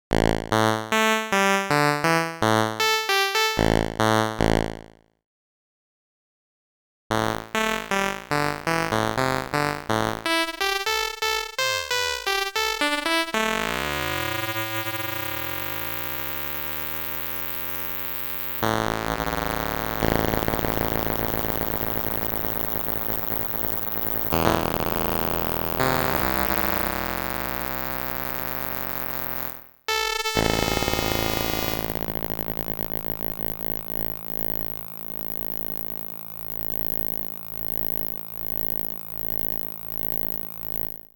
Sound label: yamaha ex5r sounds fdsp ringmod pulse raw and ringmod